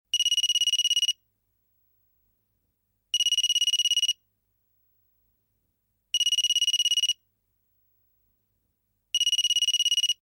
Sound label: cellphone; telephon